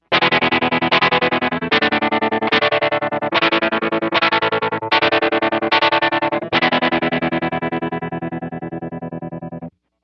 photo, Strymon Flint, Guitars; Fist Fight.

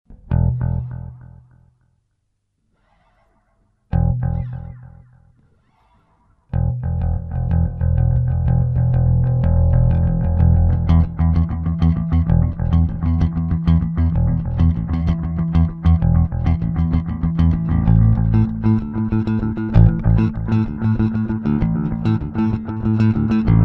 Delay bass; Delay Llama

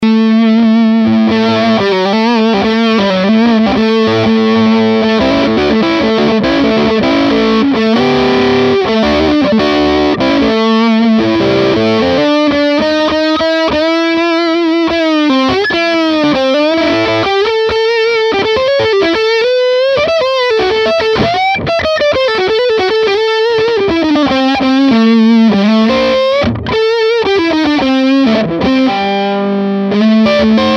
telecaster; Red Muck

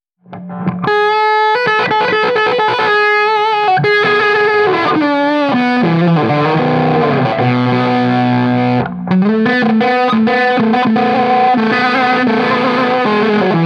Rattler strat; jam pedals

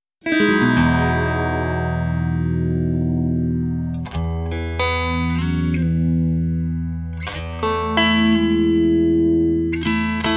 Ripple

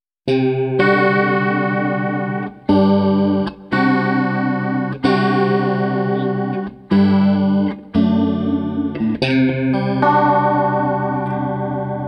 WaterFall strat

